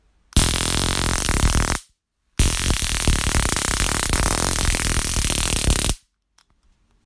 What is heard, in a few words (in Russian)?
zvuk shoker; elektroshoker bl 1201 police